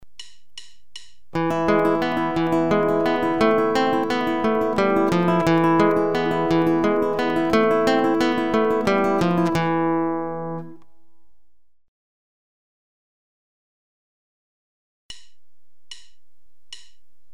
Free-stroke, arpeggio, and contrapuntal classical, guitar